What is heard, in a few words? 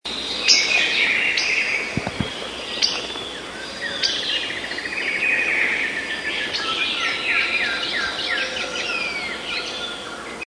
magpie tanager